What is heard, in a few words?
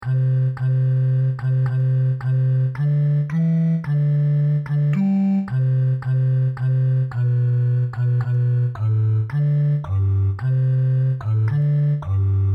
Bass; We Gather Together